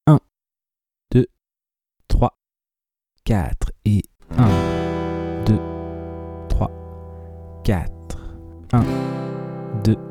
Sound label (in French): lent Passer de Em à C